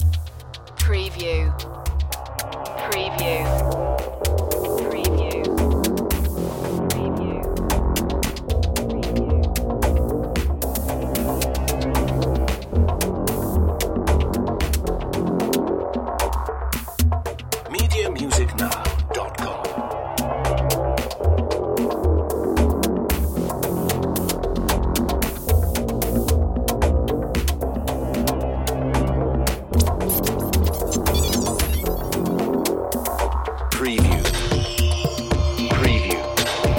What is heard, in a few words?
sea creature loop